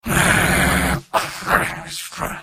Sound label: negative vocalization; engineer; video games; source engine; tf; mvm